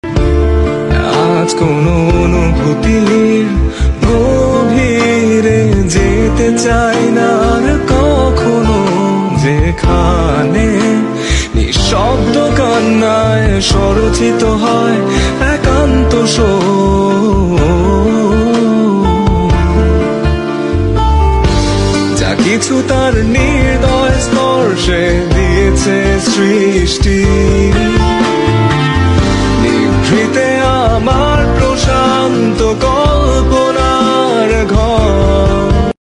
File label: bengali